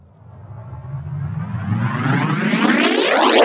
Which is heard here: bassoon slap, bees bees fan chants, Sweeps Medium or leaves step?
Sweeps Medium